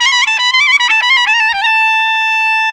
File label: ORIENT CLARI; Best Service; World Colours